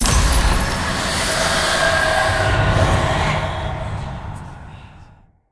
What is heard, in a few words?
scatterWin; halloweenjack